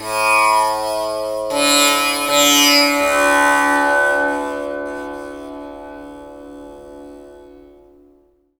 TAMPURA; Spectrasonics; Heart of Asia

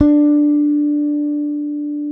bass, sustain; Sampleheads; Will Lee Bass Library

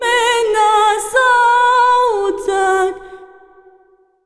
SINGER A, Arabian Traditions

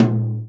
tom, Real Drum Kit, jungle kit

